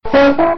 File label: death; tf2; freak fortress 2; original spookmaster